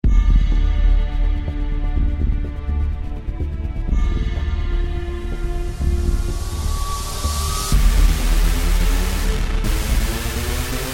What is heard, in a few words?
show intro